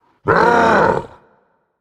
battle cry; Smite; cerberus

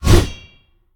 swing; Smite; god Lancelot

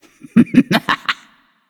laugh; cliodhna

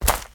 footsteps; smite; god merlin

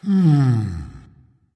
thinking, voice; smite; voicepack; ymir

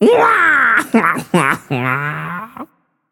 boohoo, voicepack; smite; jorm

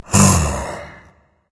sigh, berserker; cuchulainn

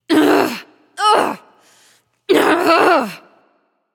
grumble; smite; themorrigan

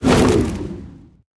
basic swing; Smite; Zhong Kui

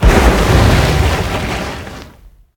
waterball roll; smite; poseidon

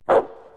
bark; Smite; Cerberus

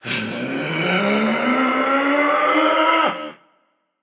battlecry, voicepack; smite; jorm

